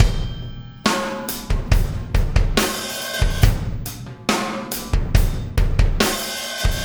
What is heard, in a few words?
Impact Crater Beat, Processed Drumkits